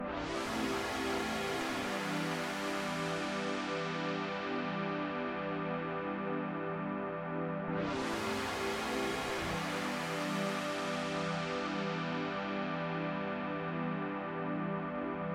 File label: Pad